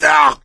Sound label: choke; Left 4 Dead 2; coach